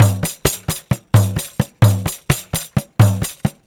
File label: Pandeiro, Perc Loops; Drum Loops; 4-4; Sonic Foundry; Drum Tools